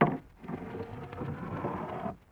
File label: Glass On Bar With Slide; Vintage Cartoon; Foley